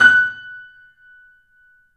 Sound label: The Piano F#; InVision Interactive - The Piano